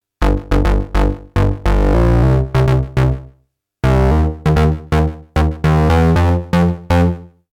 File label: fattfuzz microkorg